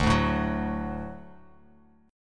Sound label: D Major D down Bass Standard; D strummed; JGuitar